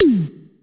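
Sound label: tom, Casio CTK-5000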